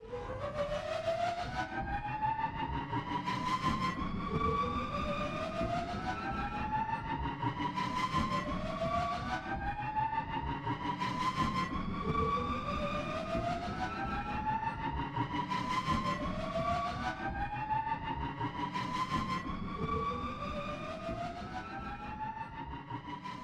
Player Hand Slide Energy Rising Loop

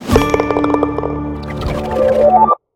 Ball Enters Golf Hole

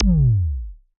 Ball Collision Forcefield Hard

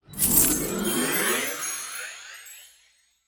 Purchase Made; UI; IAP

